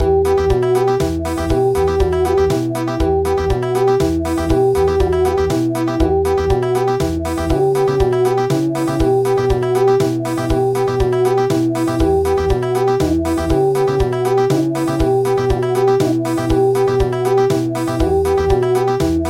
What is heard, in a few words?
Country; Carol of the Bells